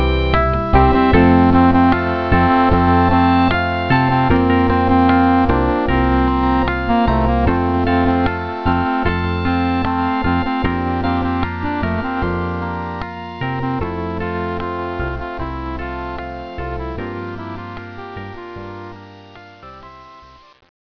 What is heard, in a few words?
Start Windows; TheWord; Christian Desktops for Win98